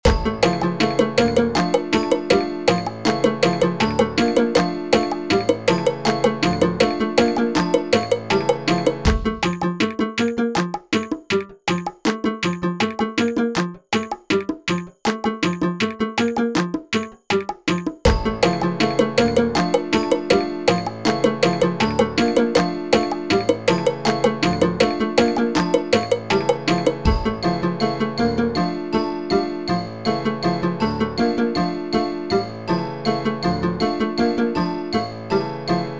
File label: for Percussion Ensemble